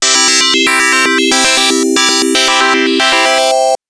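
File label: Synth FM major c3; TrashLibrary; FMCyces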